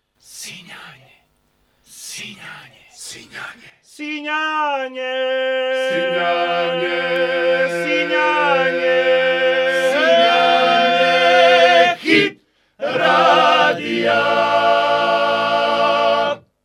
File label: Jingle; Sinjanje